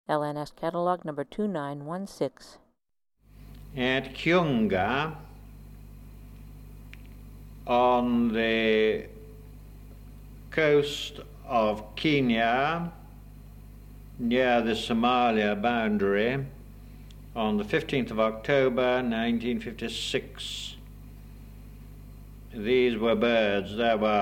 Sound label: crab plover